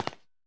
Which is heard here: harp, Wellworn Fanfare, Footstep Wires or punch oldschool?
Footstep Wires